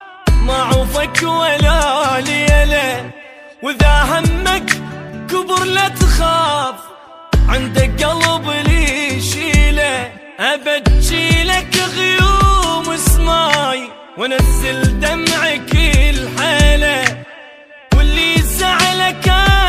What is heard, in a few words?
iraqi sad ringtone